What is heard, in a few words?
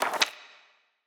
Menu SFX Achievement Book Page; Bop It! The Video Game